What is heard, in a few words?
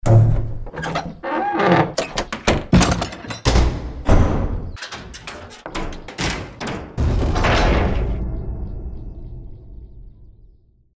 Open & Close; Cartoon Game Sound 2.0; JBoB Sound Pack Demo